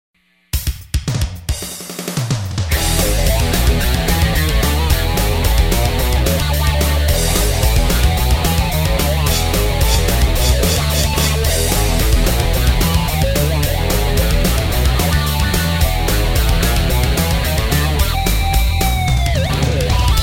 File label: Wah Wah